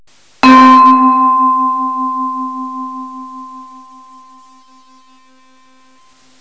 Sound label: Vibraphone; sound analysis; sound instruments